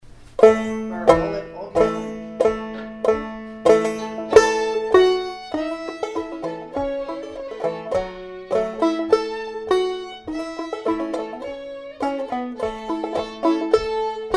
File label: Slow